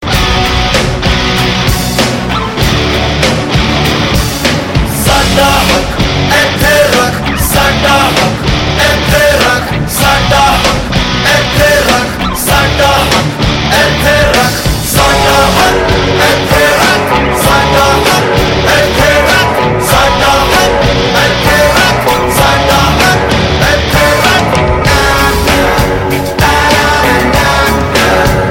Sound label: guitar, ringtone; Bollywood ringtones